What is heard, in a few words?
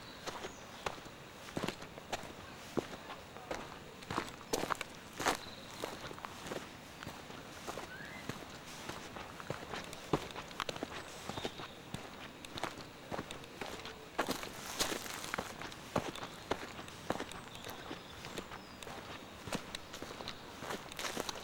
Saltwells Nature Reserve Walking In Woods